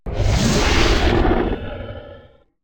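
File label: GROWL ROAR ANGRY; Chupapsound; Essential Scifi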